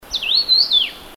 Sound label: Taiwan Yuhina; Yuhina brunneiceps; song; Bird Call Recordings